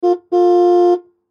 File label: Gmc Jimmy Horn Interior; Transportation Automobile; Car Sound Effects; Blastwave